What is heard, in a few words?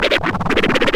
FX scratch; Club-50 - Foundations Roland; Scratch 1 S